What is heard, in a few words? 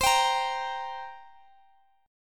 G Minor Double Flat 5th, C